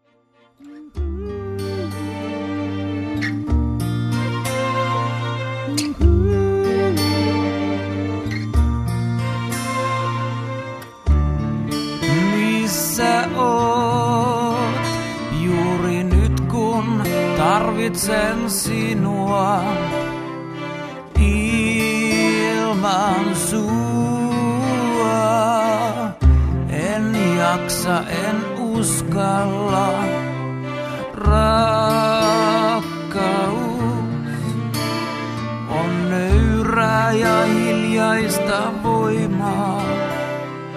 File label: unplugged